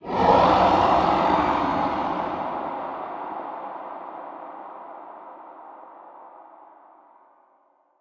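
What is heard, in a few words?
ambience unknown creatures big snake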